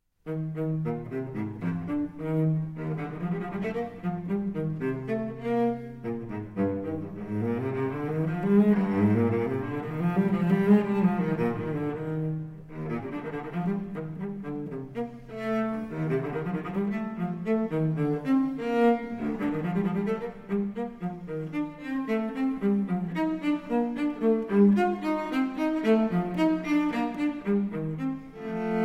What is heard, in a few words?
Cello Suite in E-Flat Major, Courante; Cello Suite No. 4; BWV 1010 III.